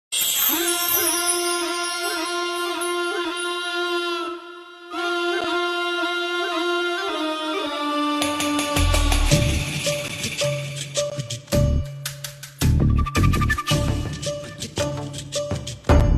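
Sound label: background Tune; Tv Serials; Star Plus; Veera Ek Veer Ki Ardaas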